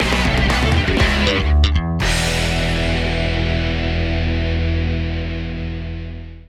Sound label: intensity stinger